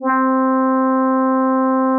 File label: brass